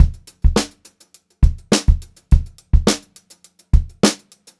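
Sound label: 104 straight variation, Funk Groove Drumloops; USB Soundscan vol.38; AKAI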